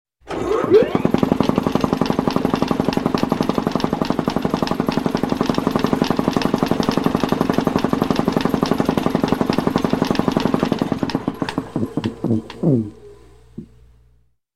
Motorized Shop Tool Compressor Motor Turn On Steady; Traffic, Sirens, motors